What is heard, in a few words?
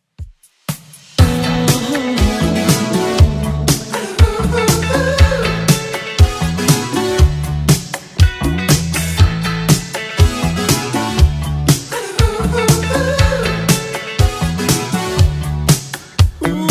80s 120bpm; Redrum